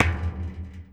Street Ball Metal Back; SFX Kit

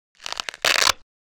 Walkie Talkie Static End Of The Connection Noise; Hollywood Edge; sound designer's toolkit v2

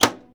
Plane P40 Click Hatch Panel; Airplanes

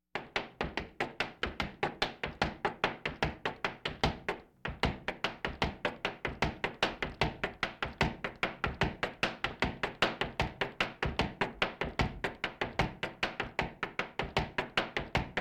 Laugh tracks; Valentino Production Sound Effects Library CD50

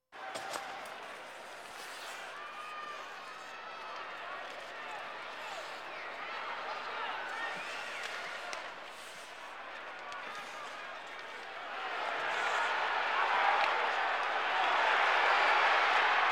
Sports crowds

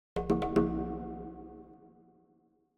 Jungle Drums Triple Hits; Hollywood Edge; sound designer's toolkit v2

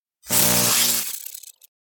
Electric Arc Short Circuit Electric Shock; Hollywood Edge; sound designer's toolkit v2

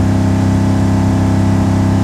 Truck F150 Loop; Trucks

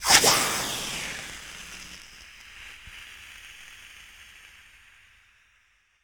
Steam Sizzle Hit

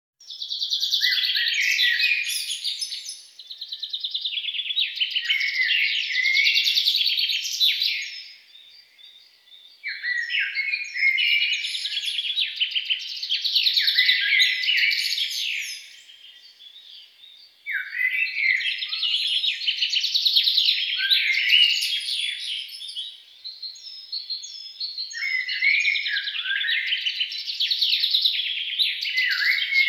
songbird; birds; best service Blue Box

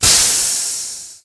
Steam Burst

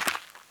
Gravel; blindside

